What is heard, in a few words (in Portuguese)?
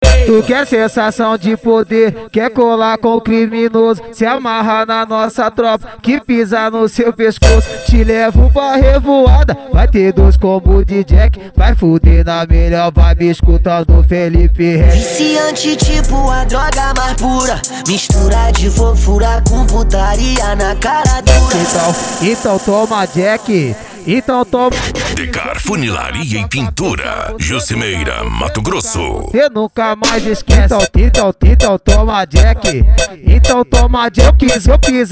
funk ritmado